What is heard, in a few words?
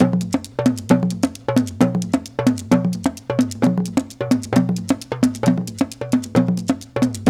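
132 bpm, Ju-Ju Talking Drum Lead; Spectrasonics; Supreme Beats; African Contemporary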